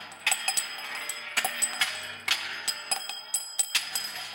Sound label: shells 20mm interior loop